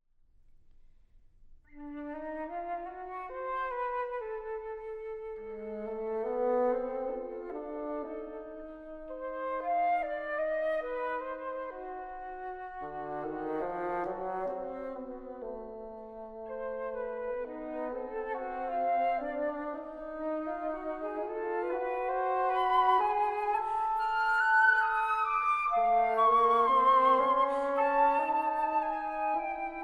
Quintet for Winds

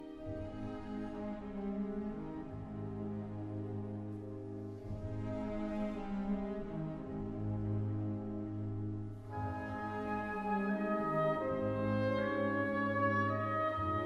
Horn Concerto, Andante Con Moto; Strauss Concertos

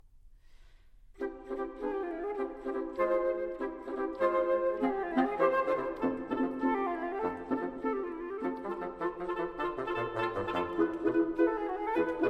Quintet, Allegro vivace, Woodwind Quintet

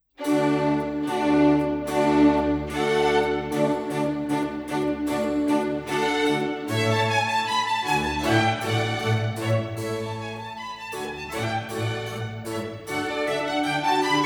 Concerto for Two Horns in D Major, Allegro

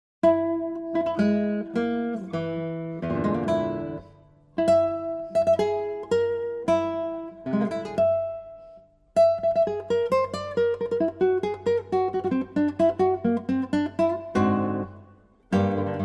Guitar; Castelnuovo-Tedesco

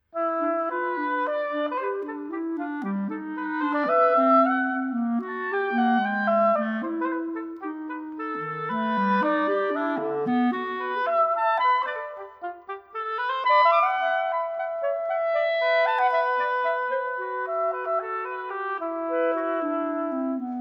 Duo for Oboe and Clarinet Pastoral

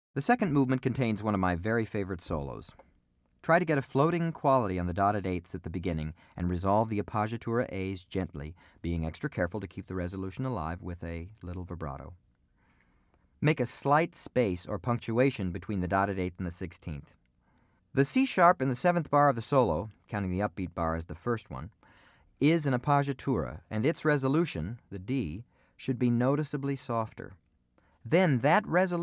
Violin Concerto, Orchestral Excerpts For Bassoon

